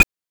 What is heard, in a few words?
CLAVES; NES DPCM; MIDINES